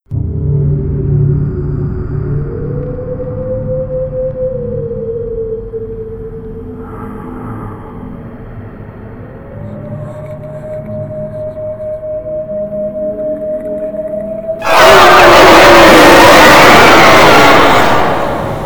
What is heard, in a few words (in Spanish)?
inicio zombie